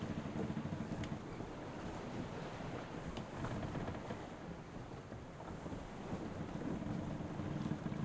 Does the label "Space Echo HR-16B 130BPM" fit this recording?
No